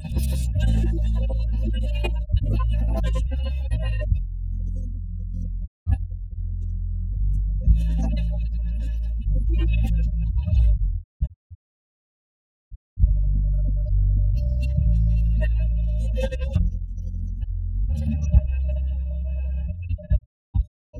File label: bass